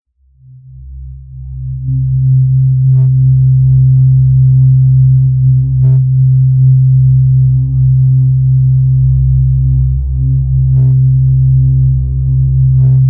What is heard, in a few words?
Reactor Start Warm Hum